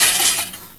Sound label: Perc Hit, drums; One Shots; Drums & Drones